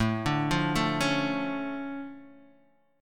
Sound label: D Minor Major 7th, A, guitar